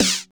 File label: CYBER KIT, DRUMS